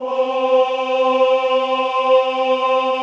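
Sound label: choir C3, Men-Combi; Sound & Vision - Gigapack I CD 2 (Roland)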